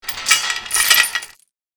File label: Chain fence metallic rattling